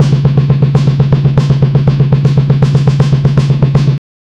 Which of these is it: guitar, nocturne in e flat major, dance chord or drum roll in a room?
drum roll in a room